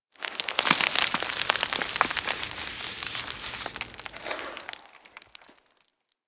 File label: Settlement Sand One Off; Ambience; Warframe; Grineer Settlement